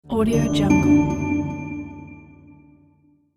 harp reveal

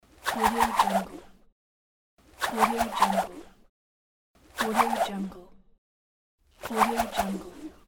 cable swinging whirl spin

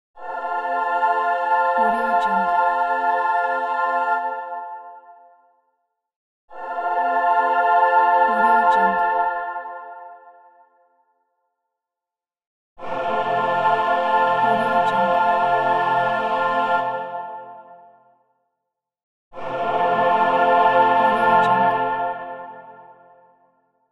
angelic choir ahh

